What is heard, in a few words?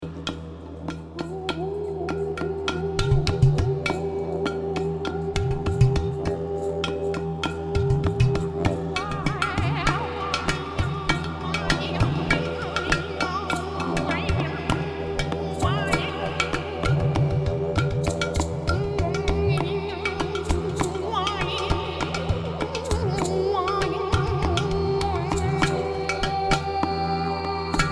Tone